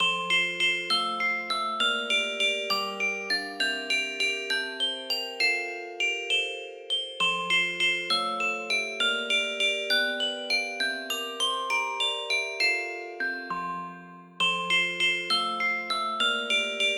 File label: Little Jack Horner (Glockenspiel)